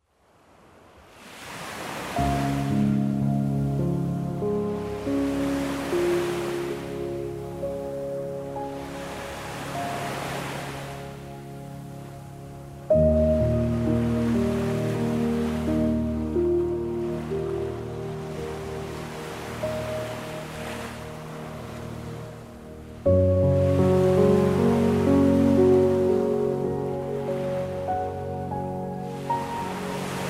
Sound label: Piano Peace Solitude Ocean Waves